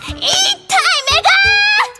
combo break

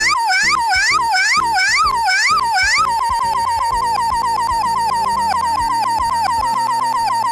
wowowwwwww

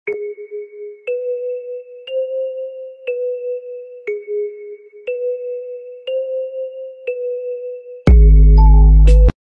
suspense

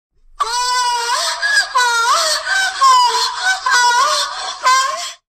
orgasm troll sound

